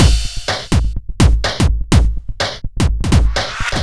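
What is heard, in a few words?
bass drum snare, Drum Loops 125bpm, Nu Dance Electro; Zero-G; Soundclash Nu Dance Electro; Acidized Wavs; Krakenhausen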